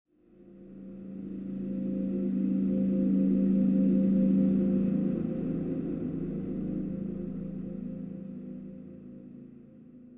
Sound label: Tension; The Last of Us; AC Tension 6 Low Chunk